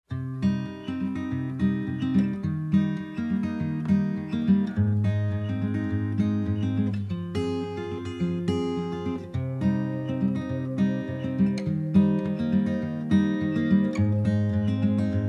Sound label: acoustic